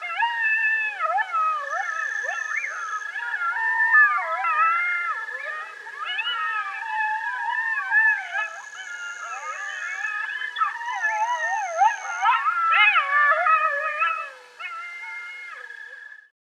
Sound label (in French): le coyote, mammiferes